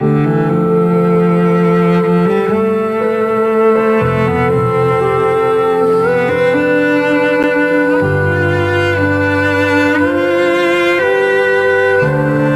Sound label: Children no Musicbox